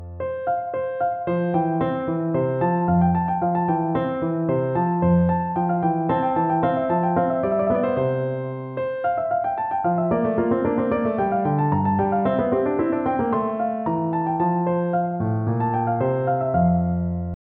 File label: Sonata, Vl Vc; Corelli; Sonata Op5-10